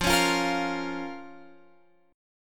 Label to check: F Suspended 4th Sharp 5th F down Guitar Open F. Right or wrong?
Right